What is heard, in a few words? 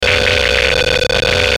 Digital Distortion Fast Chopper, Digital Electronic Whoosh; glitches; Blastwave